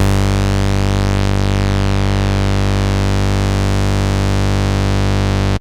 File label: PolyBass G1, Moog Voyager; Moog Voyager Sounds; VES1 PolyBass